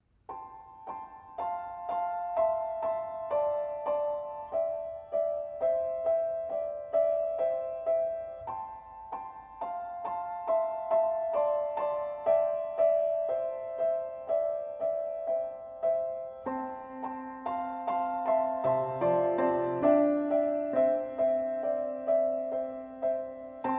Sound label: piano